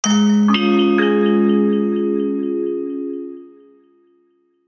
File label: music logo